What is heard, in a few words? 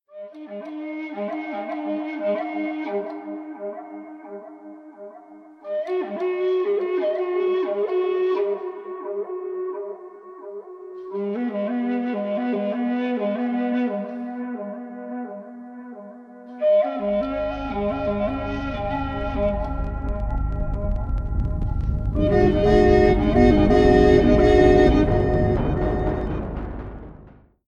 main theme flute ringtone